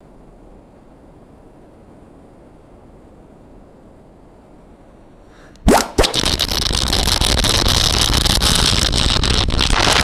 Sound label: hollow air rushing and popping in pipe